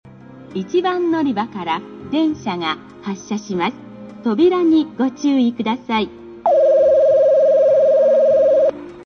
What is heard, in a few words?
subway airport